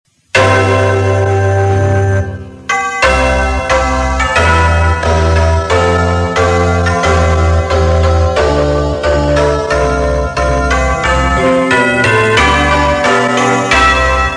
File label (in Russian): gimn ussr na cerkovnih kolokolax